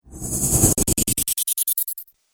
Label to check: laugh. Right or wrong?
Wrong